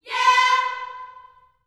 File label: "yeah" C 5, Gospel; Spectrasonics; Vocal Planet Vol.1 Gospel; akai